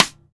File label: CStick, Rimshots, Roland BK-5